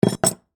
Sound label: Skeleton Key Down, Set; Foley; Jangling (Sound Effects:Objects); BlastWave